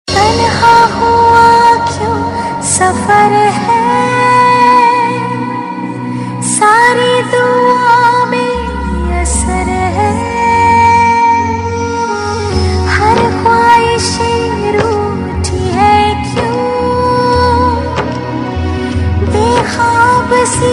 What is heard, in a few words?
Sad Tune; Zee TV; Mrs. Kaushik Ki Paanch Bahuyien; TV Serial Tone